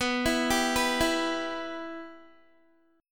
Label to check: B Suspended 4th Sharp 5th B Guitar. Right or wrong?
Right